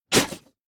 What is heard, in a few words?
spitter; factorio; Factorio 0.14.21; creatures